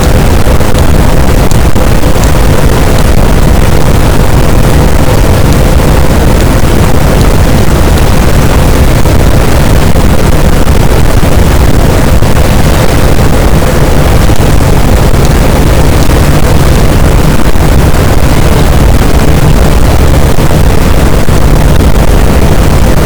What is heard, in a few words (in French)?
cachalot positif, boat, Physeter Macrocephalus